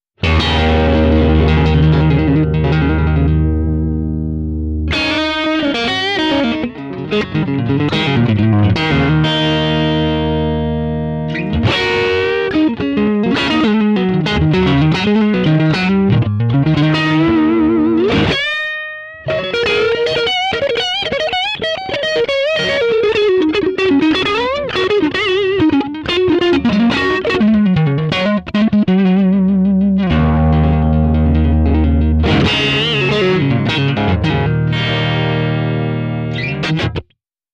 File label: clean st